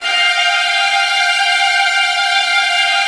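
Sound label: violin trills; Virtuoso Strings; F2; ILIO; Kirk Hunter Virtuoso Strings VOL-1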